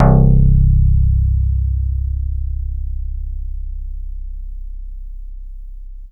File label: Yamaha DX7 Bass C2